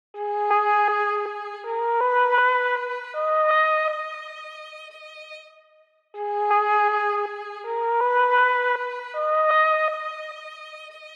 160 BPM G# Min Lead, loop stems; Melody; Cymatics; 2022 Melody Collection Platinum Expansion - Pt 2; Stories

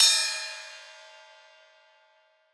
Long Tape Ride; Cymbals

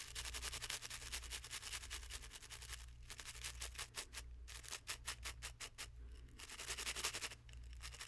Nail File; Fairlight Sound Lib

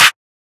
clap oneshot; GhostHack

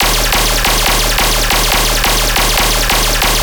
Bass Loop D# 140BPM, Dubstep

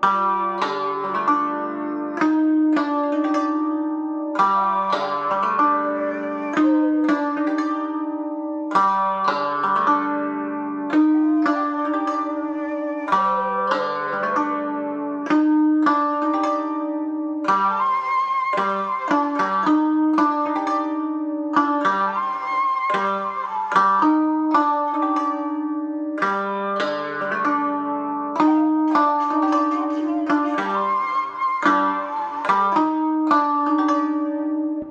110 BPM G Min, world hip hop melodies; Cyprus

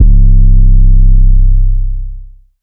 808 Cyclical (C); Cymatics; Orchid Sample Pack; 808s & Basses

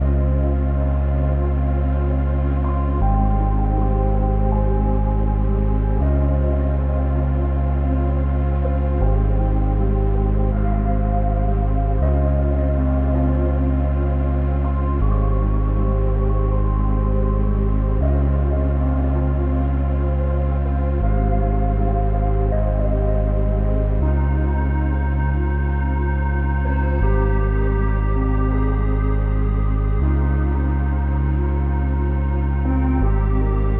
80 BPM F Min, Melody Loops, Lofi; Lofi Collection; If You're Not Real

